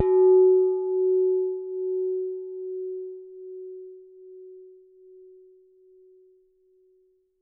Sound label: Struck Vase